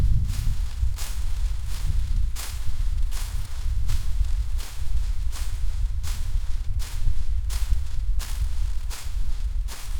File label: running on grass looping; seamless